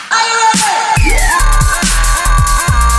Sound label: electric instrumental